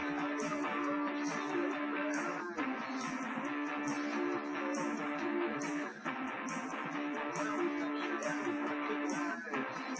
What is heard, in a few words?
wdrums liverec 250ms 5cm; SiSEC08; SiSEC underdetermined